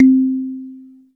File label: E-Mu Proteus FX Kalimba C4